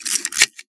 lever wind, camera

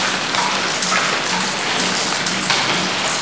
drippies

